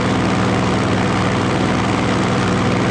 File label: Plane Prop Loop; Airplanes